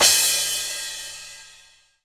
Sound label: drum crash sound g key, Royality free crash cymbal single hit tuned to the G note